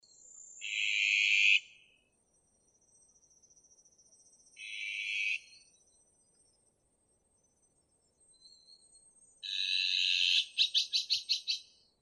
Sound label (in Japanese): onaga nakigoe